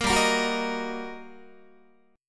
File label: G Minor Major 7th Sharp 5th, G, down, Guitar, 4A 4E 5A 5D 5G sharp 6C sharp; GmM7#5 strummed; JGuitar